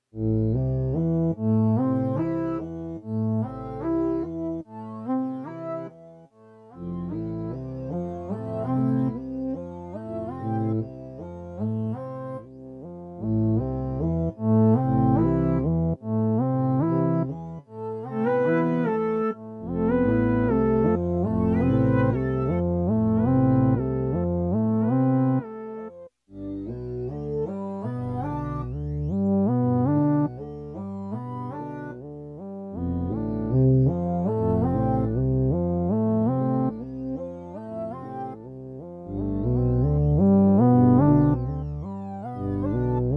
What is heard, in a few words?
delay